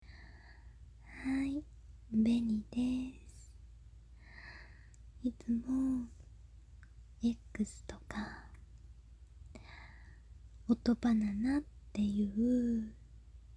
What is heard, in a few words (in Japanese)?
実演オナニー エッチなお姉さんの超至近距離な喘ぎ声; 【ほろ酔い実演オナニー】エッチなお姉さんの超至近距離な喘ぎ声で心も体も温まっちゃう!一緒にトロトロになりながら触り合いするような感覚になる極上実演!!